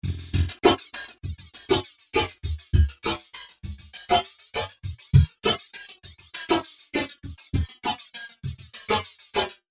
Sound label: Classic Flange; DR008 fxflange